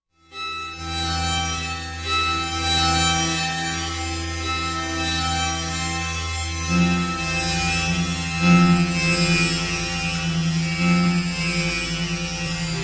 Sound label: Random Rvrs Reversing M, musical